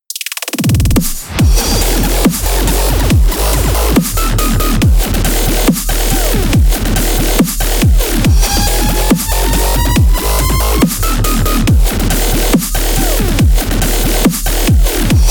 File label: bass rocket